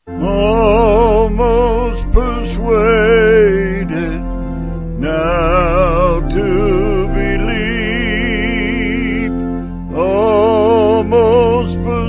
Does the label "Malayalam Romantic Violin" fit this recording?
No